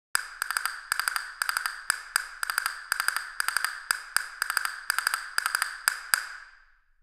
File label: castanets